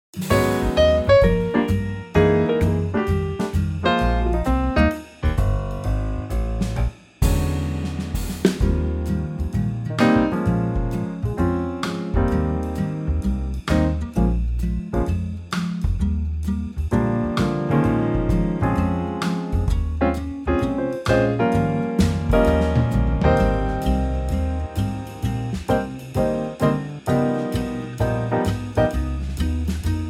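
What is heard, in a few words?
Eb Quartet, backing track; key - Eb - vocal range - Bb to Db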